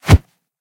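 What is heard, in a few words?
clear short sound of a knife attack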